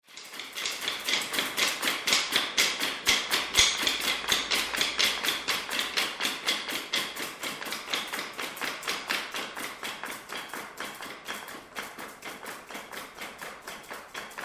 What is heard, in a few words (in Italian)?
PIANO E FORTE